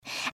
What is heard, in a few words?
breath; pony; Twilight Sparkle